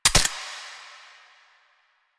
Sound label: Pistol Mech; Warframe; Tenno; Lasrian